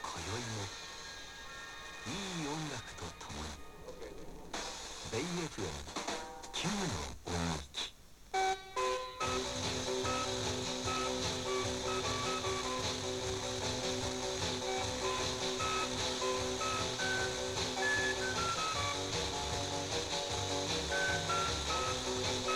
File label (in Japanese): BayFMが相当のノイズ混じりながらも受信できるようになりました, 78.0MHz; BayFM(78.0MHz船橋三山送信所・千葉県船橋市)